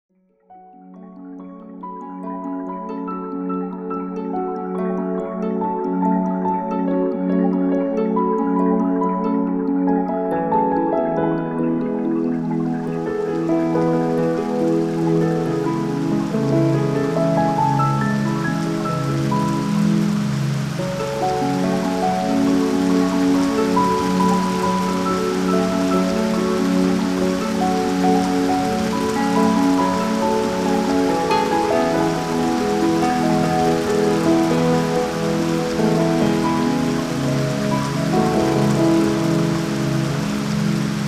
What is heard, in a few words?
Dream Music and Rain Sounds; The Arcana